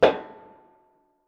Metal Strut; 99Sounds; The Warehouse

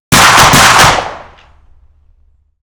SPAS-12 12g solid slug 50m behind Double shots; Ehrlund

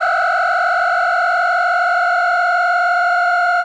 pitched, Feedback; Feedback FX; 99Sounds; Dark Engine

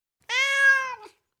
Tom Cat, Individual Meows; Cats; BBC Sound Effects Library

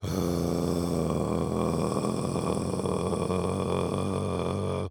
Mouthy, growl, sustained, low, steady; Eiravaein Sound

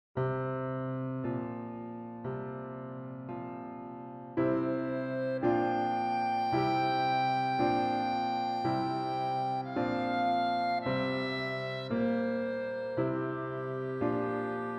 Transition, Leadings and Stings

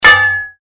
Sword Sound; FXHome; Fighting Sounds

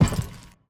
FS Metal Soldier Walk, Footsteps; Levan Nadashvili

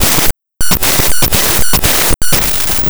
Rhythm

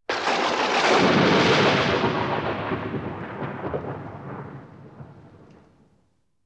Thunder Crash; BBC Sound Effects Library; Weather II

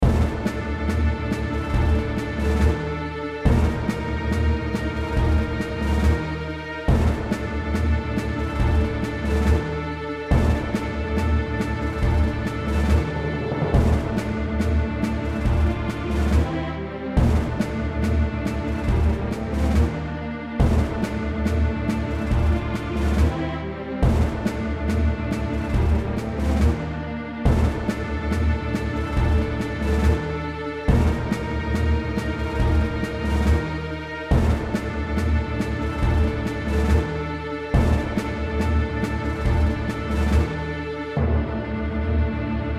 Battle, RPG; The Frontier - RPG Set

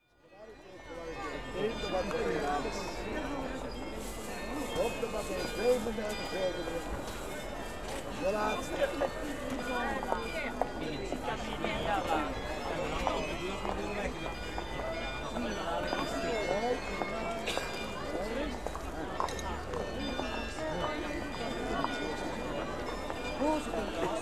Holland, Busy Market With Carillo; European Soundscapes; BBC Sound Effects Library

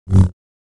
Saber; FXHome

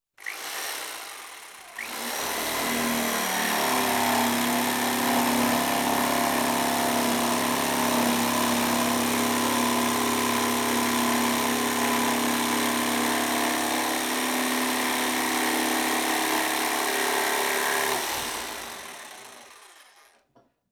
Electric Jigsaw, Small Piece Of W; Construction; BBC Sound Effects Library

